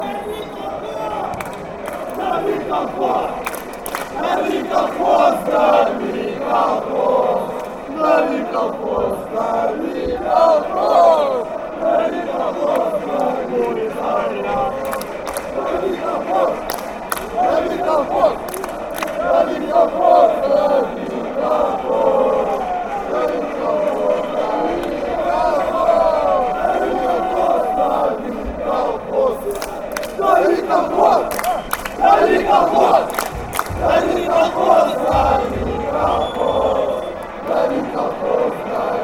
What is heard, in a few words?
metalist chant, fanchants